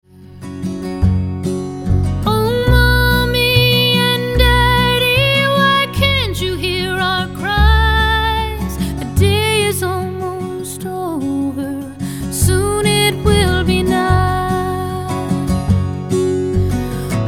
chorus

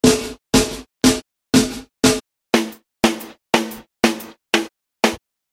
snares source

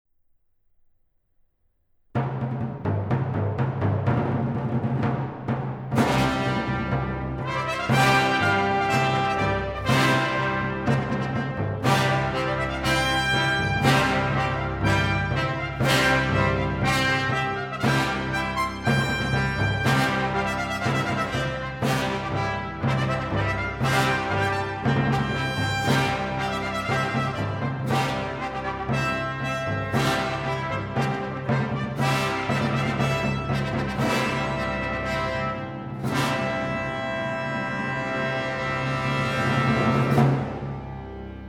toccata

